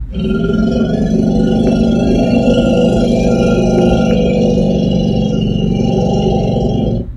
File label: Elephants rumbling near by